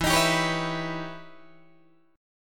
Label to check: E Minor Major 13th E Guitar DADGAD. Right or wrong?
Right